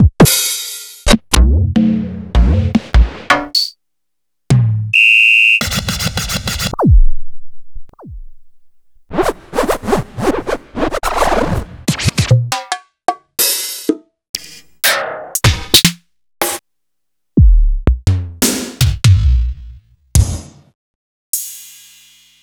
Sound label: EMU Orbit